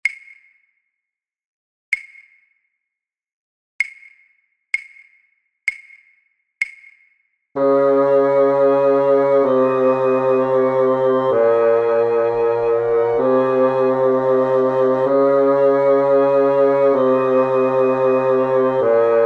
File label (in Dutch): contrabasfluit